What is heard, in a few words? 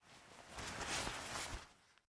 Drop Guitar; game audio; MitaCap; MiSide